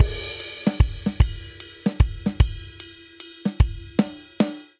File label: Swing Waltz Outro 3/4 150 bpm, drum; MIDI Clips; Ableton; Factory Packs; Drum Booth